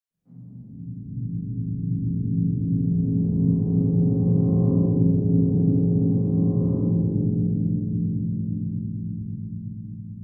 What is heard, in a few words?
Weird Loop; Insanely Twisted Shadow Planet